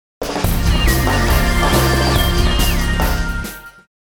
Balance Out Jingle Draw; carnage heart portable original sound track

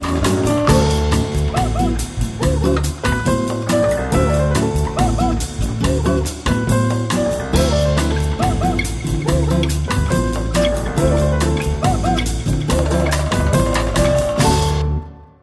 Bossa Story

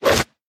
Fishmen Shield; we are the dwarves gamerip 2016

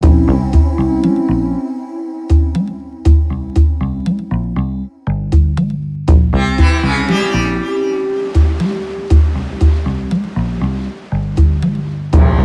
Swamp; Adventure Time: The Secret of the Nameless Kingdom

